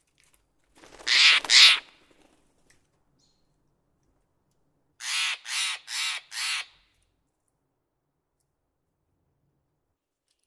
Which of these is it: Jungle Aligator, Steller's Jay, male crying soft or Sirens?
Steller's Jay